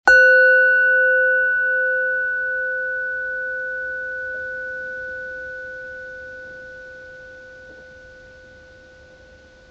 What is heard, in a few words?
Singing Bowl